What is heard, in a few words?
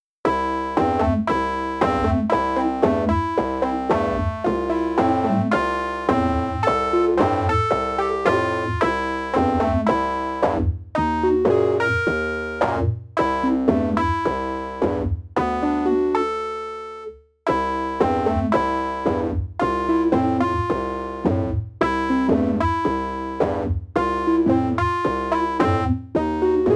east european cartoon; video games